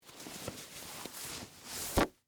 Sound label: Track Pants Put On, Foley, Clothes, Track, Pants, Put, On; Dressing; Human